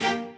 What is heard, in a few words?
strings